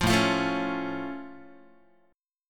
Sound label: A Augmented A down Guitar; strummed; 8,5,8,7,5,x; JGuitar